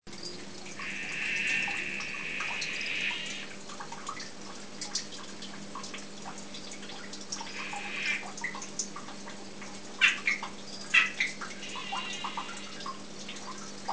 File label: dendropsophus columbianus ruf